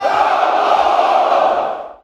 Us Soldier War Chant

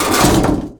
Metal Plate Sliding

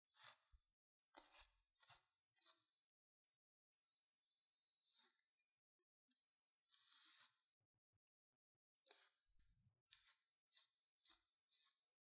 Combing Hair

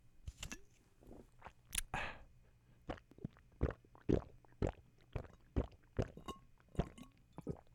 Drinking Water

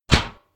Shield Hit

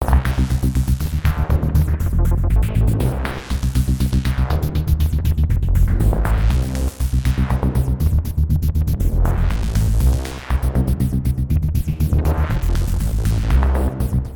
Critical Mass Bass Loop

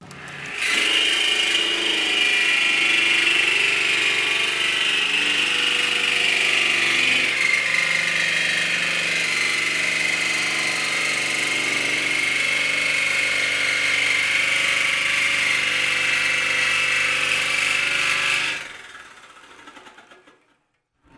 Reciprocating Saw

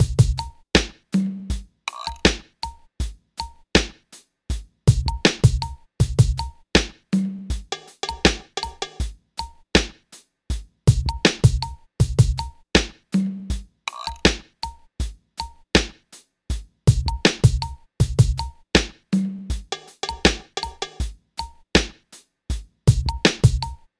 Hip Hop Drum Loop

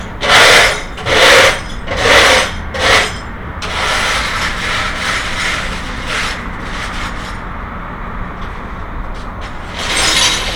Glass Sweeping

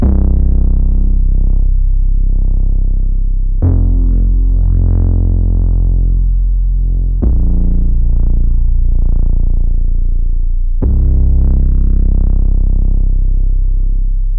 Deep Vintage Bass Loop

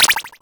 Menu Button

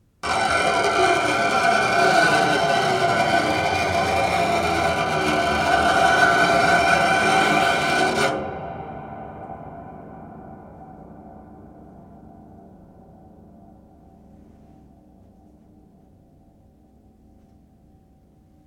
Scrapes

